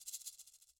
shake roll; angband

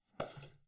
squish hit; angband

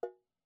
bell mute; angband

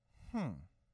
bad hmm; angband

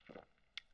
liquid squirt; angband

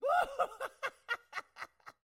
man whoohaha; angband

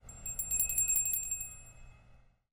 bell dingaling; angband

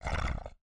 snarl short; angband

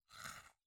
bite dainty; angband

